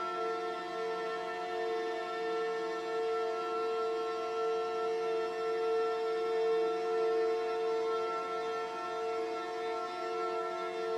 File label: harmonics